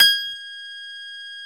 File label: Piano Gb4